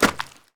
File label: ice land; Golden Crayon Sounds